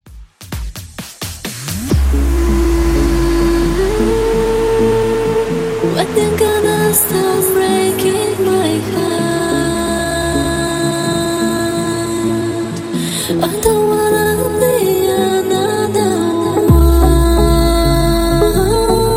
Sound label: Tribal Dutch ReBuild Hype 130 BPM